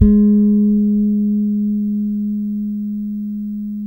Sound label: G#4, Bass, FINGERED; Spectrasonics; Bass Legends